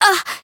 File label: Voice damaged